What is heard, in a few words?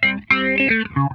RISE; Funk Guitar; Zero G - Funk Guitar